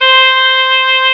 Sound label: oboe C5; Star Trek JR Audio